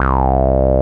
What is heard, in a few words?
A BASS; CMP - Analogon